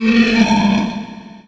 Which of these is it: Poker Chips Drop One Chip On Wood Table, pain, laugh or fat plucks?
pain